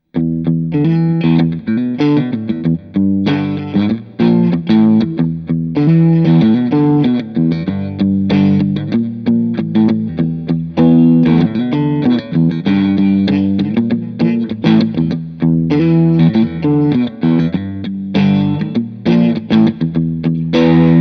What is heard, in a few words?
Crunch